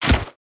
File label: rubbing clothes punch